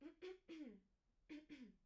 clear throat; IEEE AASP

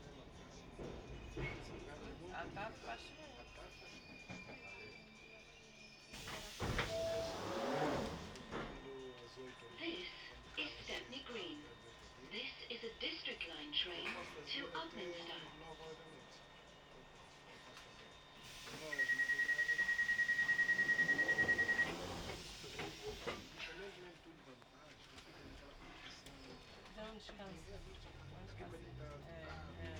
tube, scenes; IEEE AASP

